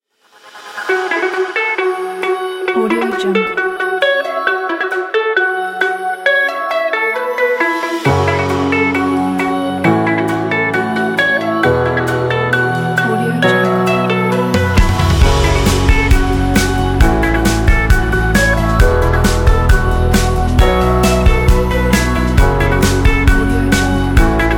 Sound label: dance